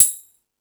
Percussion

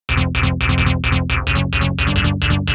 Stab Loops; neuro; Optiv & BTK

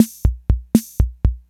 INTROS FILL, DRUMS PERC YAMAHA; Rubber Chicken Software Sample Bank

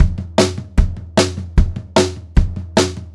152 SP TOM, Pop-Rock Drumloops; USB Soundscan vol.37; AKAI; 90 s Sample CDs; 152 SPEED

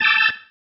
Stab, Organ, One Shots; Sonic Foundry (Sony Creative Software); Joe Vitale Organ Donor

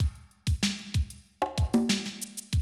Classic DrumLoops

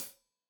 Hat Tight, Hi-Hat, Cymbals; Deeply Sampled Drums

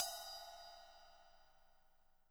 SPLSH, SPLASHES

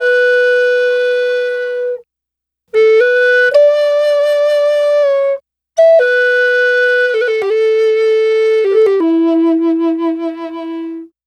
Native Flute, Native American; Sonic Foundry (Sony Creative Software) - Continental Drift Aftershocks

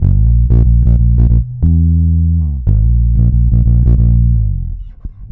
A#, bass; Spectrasonics; Bass Legends CD 1; S.BRONX

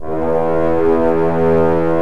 FRENCH HORNS; OMI - Universe of Sounds

